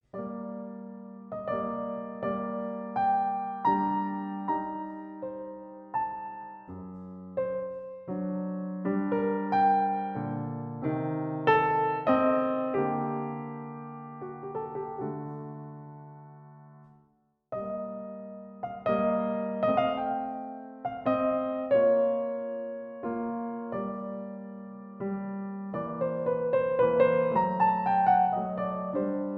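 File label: Clasical recording, Pianoteq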